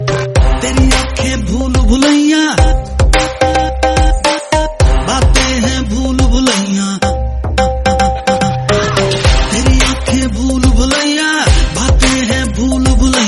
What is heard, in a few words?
hindi song